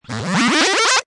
Cartoon Wabblespill, Strange cartoon swish, twirl, zip sound.; Comedy/Cartoon; Funny Sounds